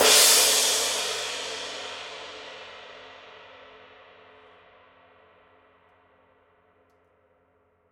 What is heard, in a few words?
PAISTE TWENTY MASTER DARK RIDE